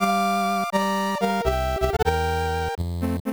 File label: Underwater; SMW